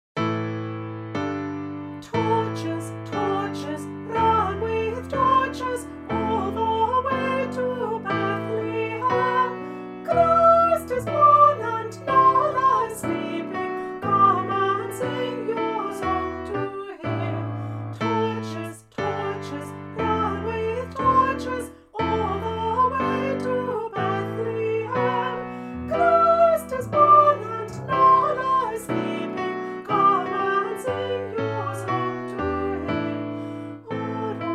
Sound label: torches vocal, choir; christmas past and present choir celebration 2024